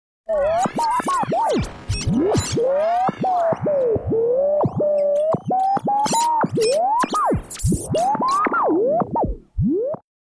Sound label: Synth Radio Tuning & Shifting Through Frequencies; Electronic Sci-fi